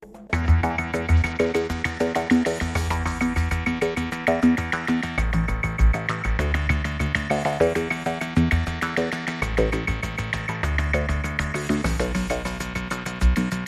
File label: Ambient Dub